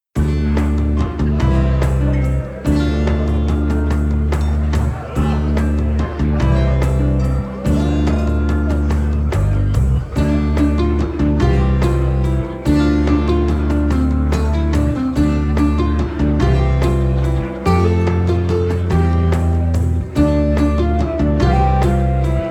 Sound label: Welcome to the Tavern Brawl (extended version with crowd); Pirates Outlaws